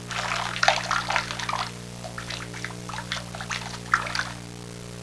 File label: walk water